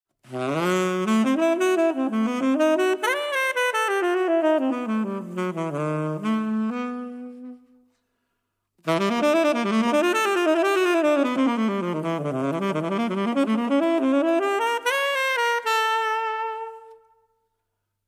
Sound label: gentle rain